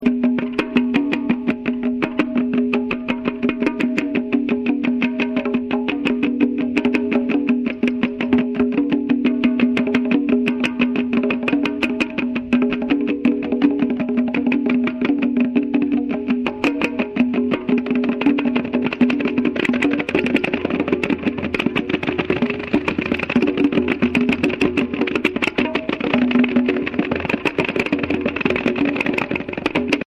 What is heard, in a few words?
conga delay